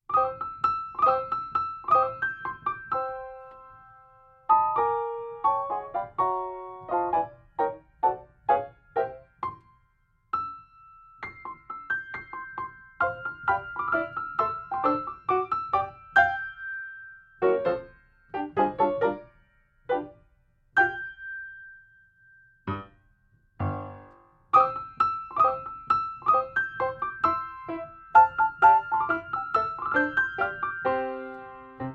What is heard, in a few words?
Jingle Bells